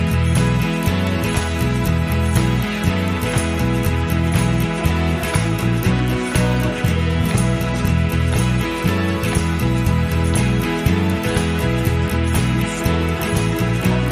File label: Inst